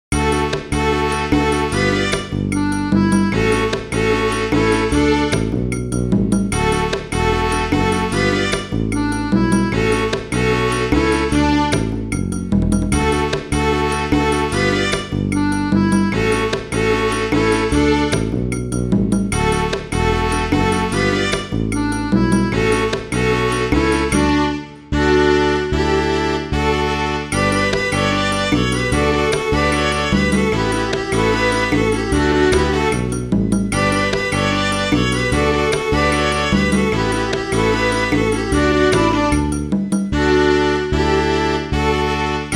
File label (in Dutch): rodeo snel; cuba